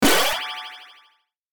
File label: Builder game power up item